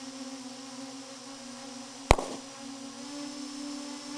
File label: 38 With Drone, Gunshot, 38 special